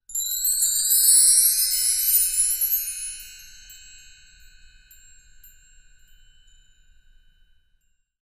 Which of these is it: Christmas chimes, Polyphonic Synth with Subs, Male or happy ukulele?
Christmas chimes